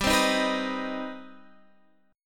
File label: C Minor Major 7th, G, chord